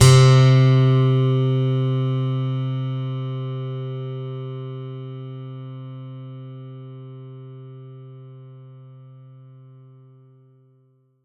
Yamaha EX5 Brite Steel C3

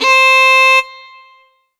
Alesis Fusion Violin C5

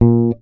Electric Bass High Bb Staccato

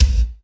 Korg M3R Industrial Kick

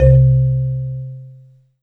Yamaha SY22 Marimba C2

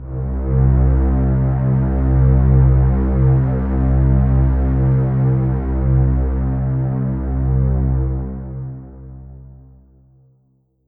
Ensoniq ZR-76 Warm Bath C2

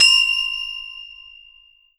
Alesis Sanctuary QCard Crotales C6

